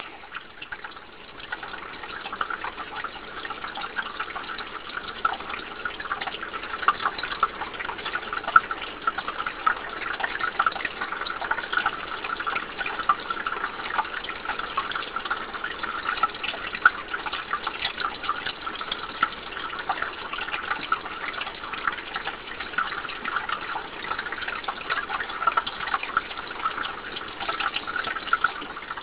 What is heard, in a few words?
spring; Sensorium; kawasaki